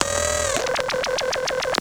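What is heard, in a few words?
FX left, attacks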